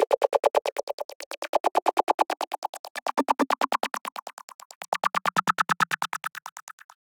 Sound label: Synth Bass (Csus) 120bpm Hook; Nubb Scraper; Ableton; Factory Packs; Samplification; Fizner Bass